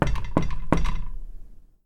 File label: knock on glass door; knocking